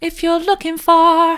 soft, vocal

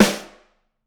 Rim, drum

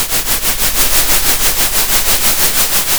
center, Sound of a train

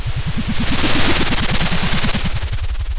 Sound of waves crashing on a shore

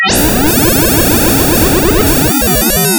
pop

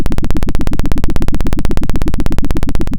pop, Sound of a helicopter

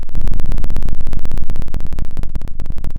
pop, Sound of a cat purring

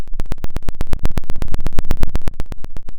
pop, Sound of a dinosaur

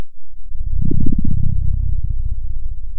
Sound of a dinosaur

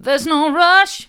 theres no rush mid, vocal

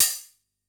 Elec Op Hat, drum

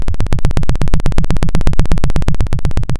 Sound of a helicopter

